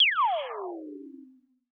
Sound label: SPACE FX 140; SpaceSounds